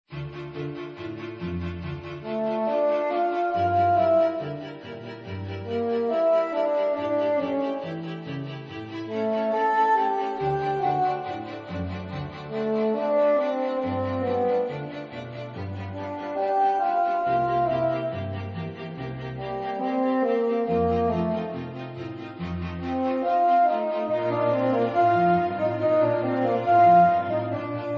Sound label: Quartet